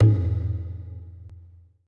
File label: E LOG, ETH DRUMS